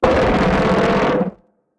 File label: HAMMERHEAD idle; Hammerhead gold